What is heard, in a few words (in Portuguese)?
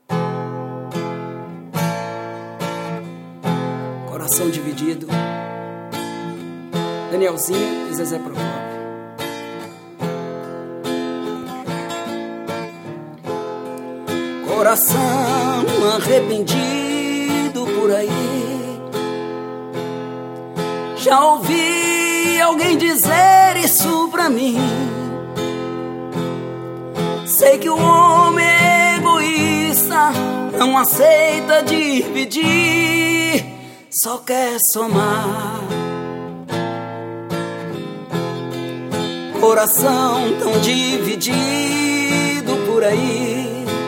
sertanejo romantico